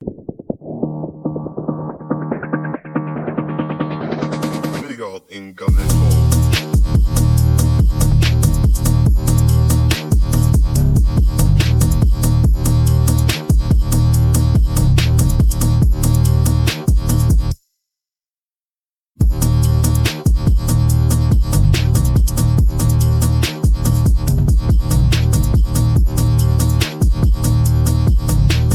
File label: ethereal escape phonk